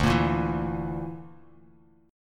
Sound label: D Suspended 2nd Sharp 5th D, Bass Standard